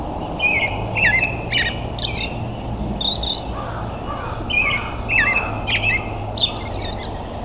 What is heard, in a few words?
robin; natureNavigator